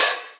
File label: Open HH, Cymbals